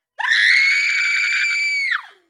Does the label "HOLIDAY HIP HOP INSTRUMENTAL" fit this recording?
No